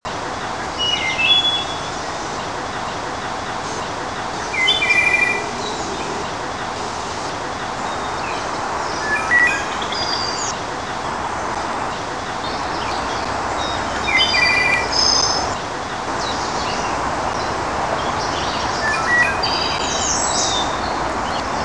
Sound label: wood thrush; Birds; Thrushes Robins